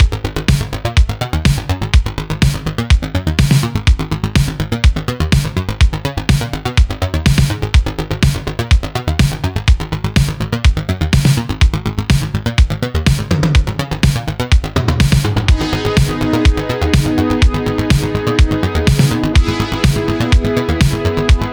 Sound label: Boss Final Intro 124; Wise The Wise Boss Final 6